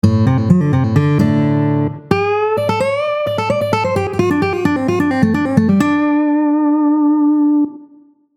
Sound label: show off solo, guitar